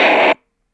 Snare Lo Speaker, Stylophone Beatbox

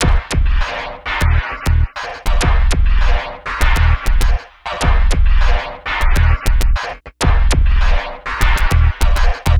Zappy Loop; Sonic Foundry (Sony Creative Software); Esoterik Beatz

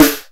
Snare, Roland JX-305

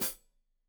Hat Foot, Hi-Hat, Cymbals Stereo

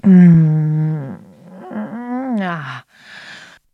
SEX; Zero G; Man Machine

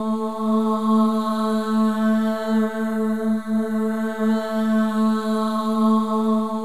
MOVING V4, Symphony of Voices; Spectrasonics

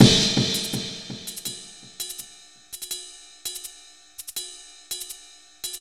165, Drum Loops, Drum & Bass

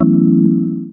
org jazz, Stabs; E Lab; XTc Files of House

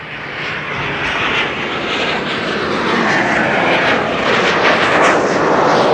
PASS, PLANES; East West - Global Sounds

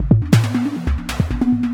percussion loops, Techno; PS-45

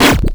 grind, EMU XL-7

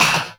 SD, Drum & Bass, 150 BPM; Ueberschall; Drum & Bass Resonance

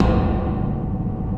METAL, PRC METAL & WOOD; Roland - LA Composer vol. 1

